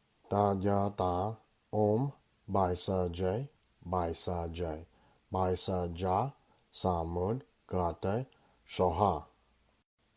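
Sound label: Medicine Buddha Sanskrit Mantra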